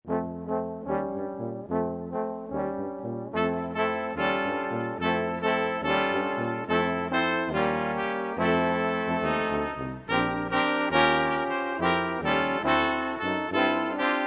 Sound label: jingle bells